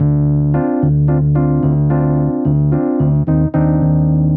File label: jazz keys ElPiano 110 B-line n Riff D